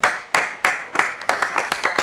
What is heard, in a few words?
single staggered crowd handclap with reverb